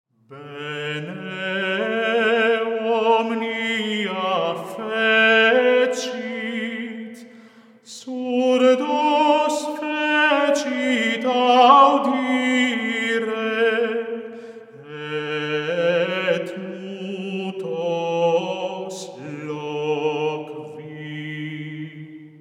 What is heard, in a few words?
Antiphon; Bene omnia fecit